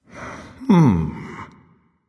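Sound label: thinking, nonverbal; smite; khepri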